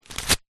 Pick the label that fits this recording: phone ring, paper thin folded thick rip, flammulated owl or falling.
paper thin folded thick rip